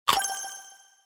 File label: bite